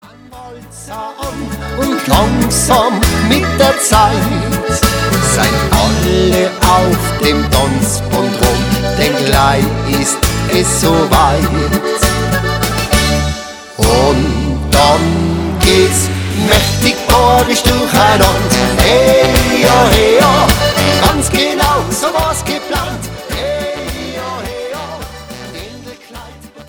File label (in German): maechtig boarisch